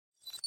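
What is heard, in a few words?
Teleport Activate Reverse; viewfinder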